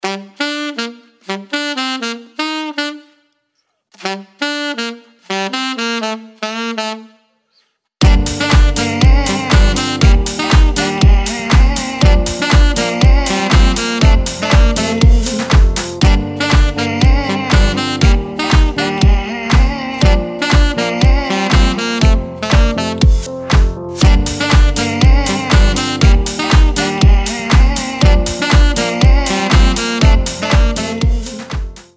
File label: vibes; san luis